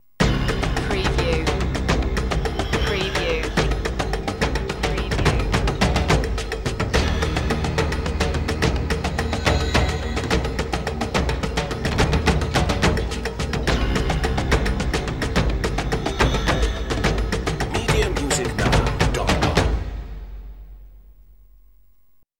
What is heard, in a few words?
tribal drumming